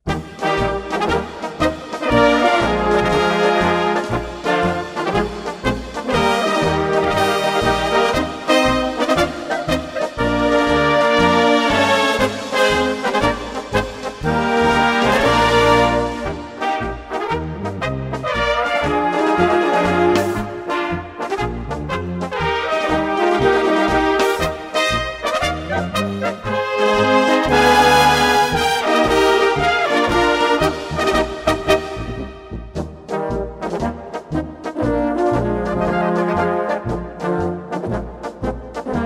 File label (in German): 100 Takte Blasmusik